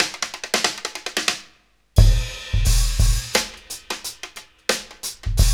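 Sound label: 88 bpm, Funky Drums; AMG; Now CD-ROM (Roland); Neil Conti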